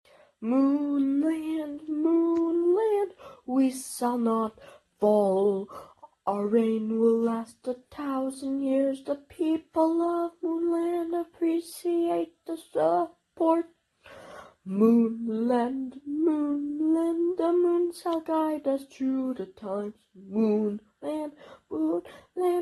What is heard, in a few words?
National Anthem; Moon Land